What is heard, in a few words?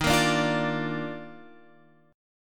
D sharp Major 7th Suspended 2nd D sharp, chord